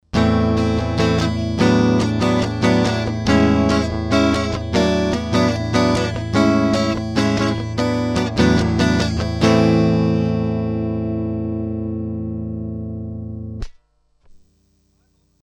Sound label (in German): GAP Pre 73 DLX MKII Gitarre DI Gain 20 HP 0 LOW Z